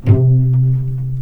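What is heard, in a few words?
C2 pizzicato cello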